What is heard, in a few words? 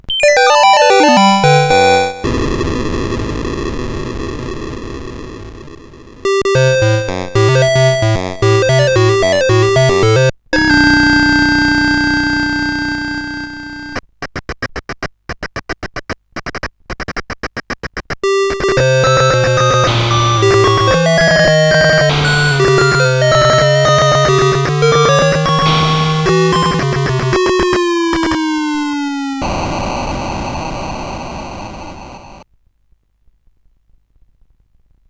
Musicbox, CSG8580R5; hvsc